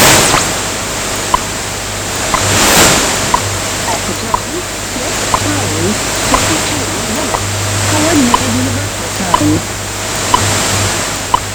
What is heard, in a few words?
wwvh